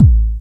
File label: BEAT BASE; Q Up Arts; Dream Experience; DRUMS PERC